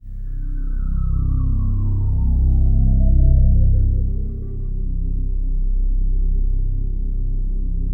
COSMIC STR, PADS; Masterbits; Vintage Voltage

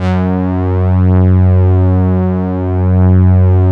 STRINGZY F#1; Strictly Synthe3; E-MU Producer Series Vol. 6 – Analogia Project

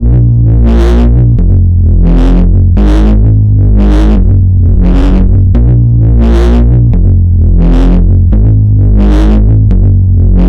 Can Can Bass, Drum n Bass; KJ Sawka; Drum n Bass & Beyond

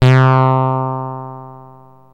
Access Virus B; Synthworx

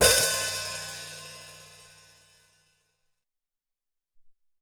Br Hat Op, Alesis SR-18

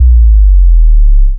Single Electric Basses, Dance Floor Bass

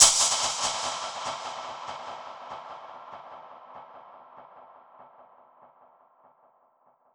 Perc Hit, dub percussion; musicradar

